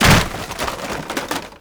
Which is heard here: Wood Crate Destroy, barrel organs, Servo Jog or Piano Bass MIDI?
Wood Crate Destroy